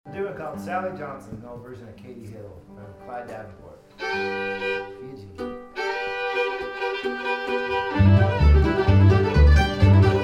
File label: Sally Johnson, mandolin; Foghorn